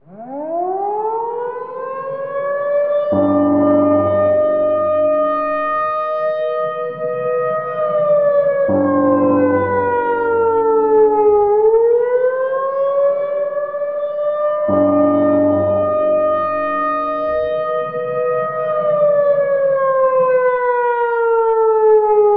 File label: air raid muffled; ambience